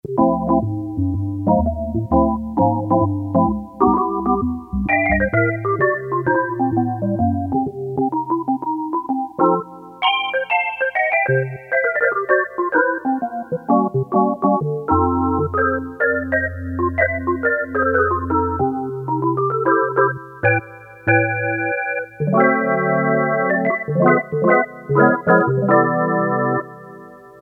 Jazz Organ, Casio CT-370; Tones

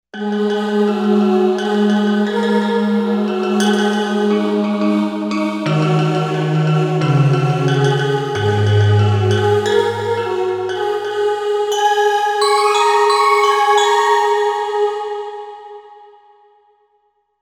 Vox Gamelan

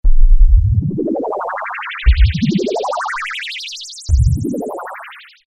FX Rise, Roland Juno-106; Factory Preset

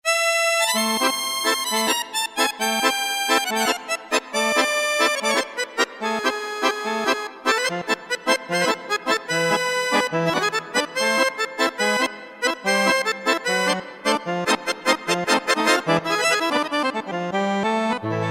Accordion, Roland MT-32

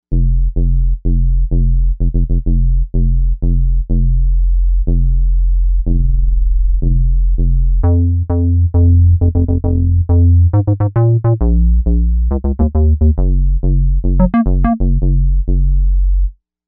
Square Bass; Novation UltraNova; Factory Patches

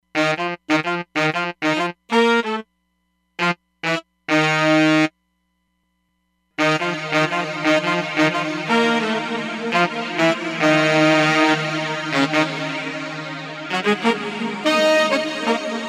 Delay Flanged & Phased long room, Roland DEP-5; Factory Patches

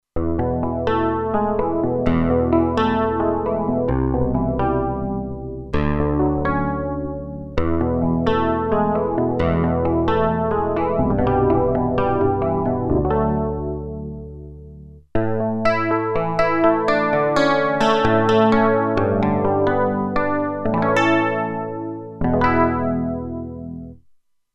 Chorus Guitar, Roland Alpha Juno-2; Original Sound Chart patches

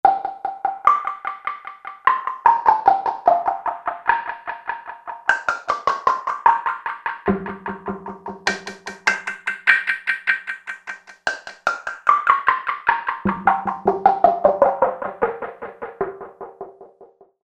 Room Hit, Korg MSC-2S Synth; Synth 1

